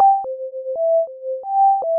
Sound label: melody